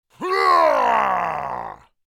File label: Orc creature battle cry